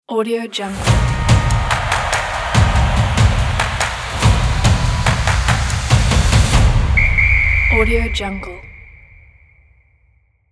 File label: the sport logo